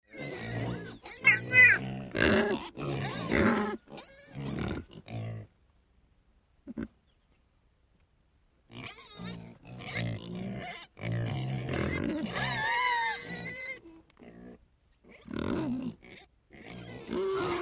Pig Family Grunting

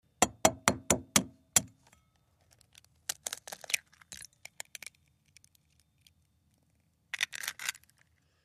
Cracking Egg A Bowl, kitchens

